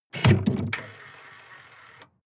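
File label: sports car exterior trunk unlock; verkehr transport; autos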